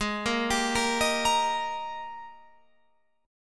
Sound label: G sharp Major 7th Suspended 2nd, Guitar, Open F; 20,17,19,17,18,17; JGuitar